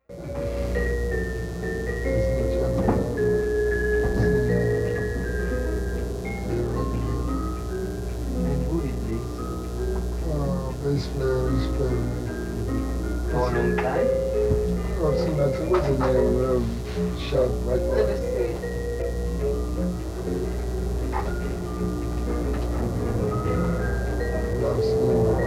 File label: German girl and bass player